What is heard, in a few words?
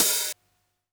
Hat Open, drumkits 707